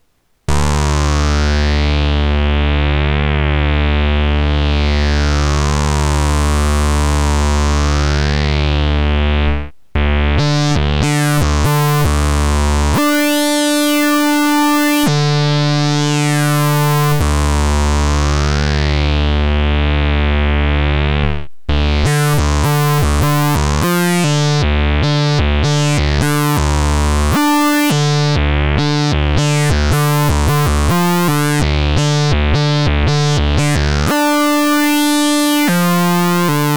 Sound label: E-mu Lead